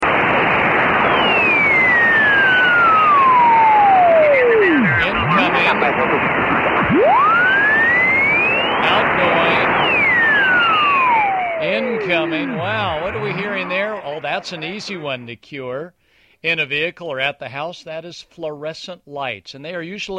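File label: fluorescent, noise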